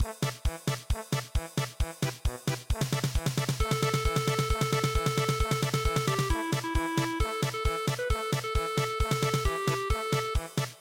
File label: Polka; Daruma